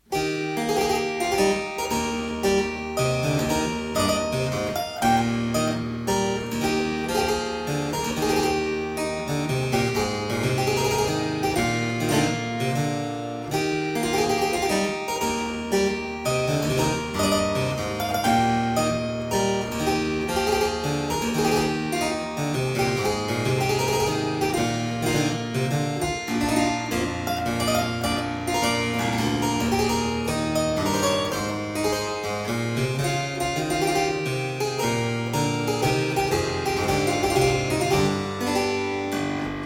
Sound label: Gaillarde, Harpsichord Music; Colin Booth; Louis Couperin